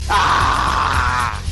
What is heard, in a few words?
aaaaargh